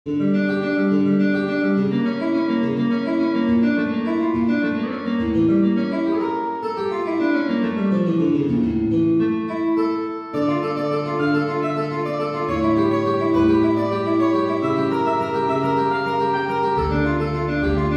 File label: d minor midi guitar